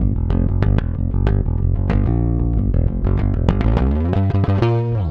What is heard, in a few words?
Rock, Bass; Bass X